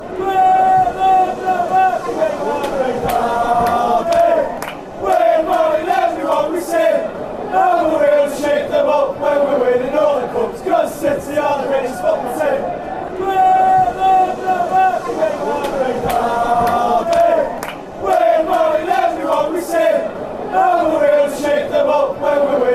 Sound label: marching abu dhabi, fanchants